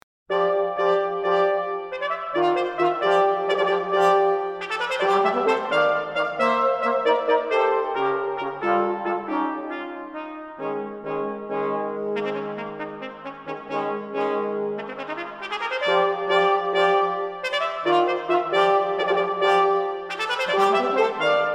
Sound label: Trio for Brass Allegro deciso